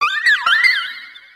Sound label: Cry; Pokemon Essentials; Pokemon Iberia V1.03